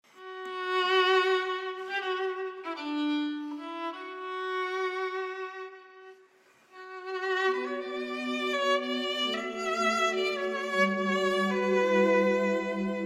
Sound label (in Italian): Violino; Improvvisazioni varie